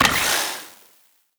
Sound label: croc saw